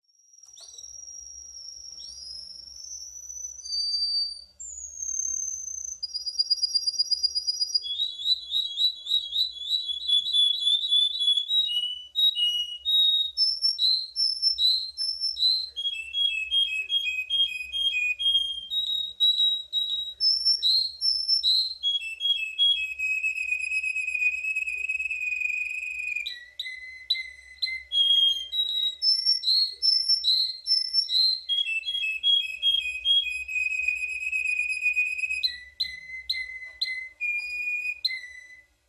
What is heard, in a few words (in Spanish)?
cantor ruso, canarios timbrados